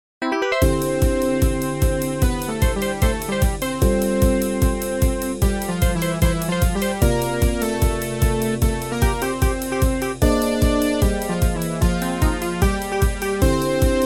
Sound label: relay